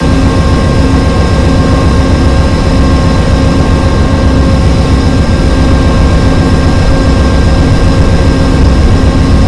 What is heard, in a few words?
P42 HEP generator